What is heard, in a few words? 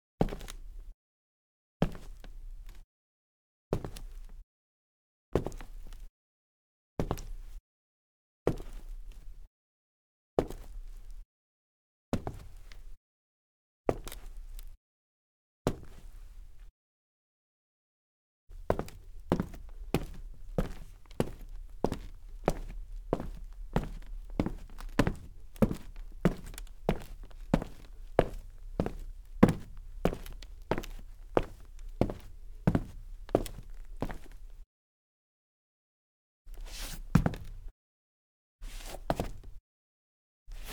Wood Soft Sneakers, Foley Footsteps; Sound Ideas